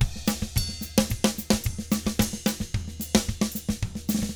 SMBA, SAMBA; ILIO; Double Platinum Drums 1

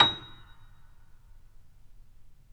PP A#6, Grand Piano; AKAI S6000 CD-ROM - Volume 2; Grand Piano 256M

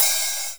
open hat

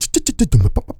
MACHINEHEAD, Vocal Blues Soul; Spectrasonics; Vocal Planet

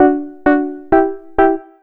KEY, 2 Step; USB Soundscan vol.51; House Side Of 2 Step; AKAI

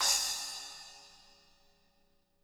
splash, splashes

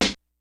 snares; Kid Nepro; Elite; KICKS SNARES

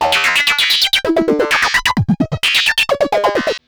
Android Arps 130BPM; sci-fi; Loops

